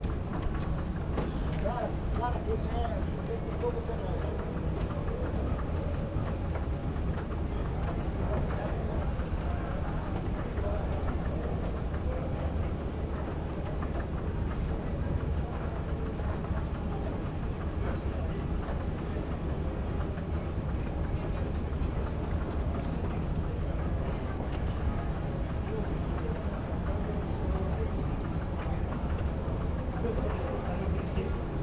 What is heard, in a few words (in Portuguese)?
Ambiencia escada rolante congresso nacional com poucas pessoas; ambiente interno